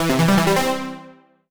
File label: MUSIC EFFECT Solo Warm Synth Positive (stereo); startide macos switch windows gamerip 2017